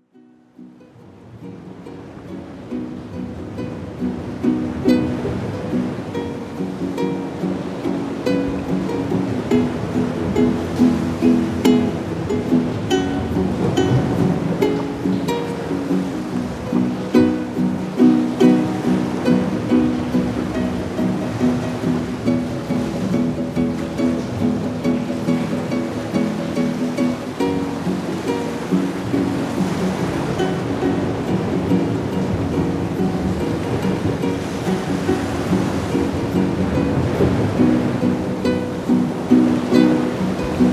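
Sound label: Sea Sound - Autumn Breeze. Sounds And Noise Of Sea, Ocean, Waves, Gulls, Stream, Water; Zvuk Moria; Osennii Briz. Zvuki I Shum Moria, Okeana, Voln, Chaek, Ruchia, Vody I Berega.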